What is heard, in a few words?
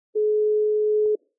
in call; simplex-chat